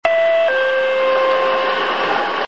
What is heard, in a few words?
bus chime; 遠州鉄道